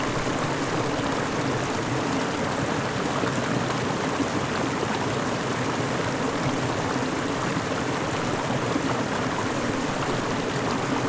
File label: Latrastrond River Close; field-recordings; grenivik